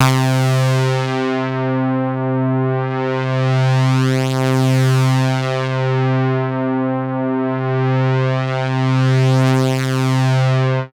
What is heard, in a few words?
C3, BASS; Q Up Arts; Dream Experience; BASS BANK 15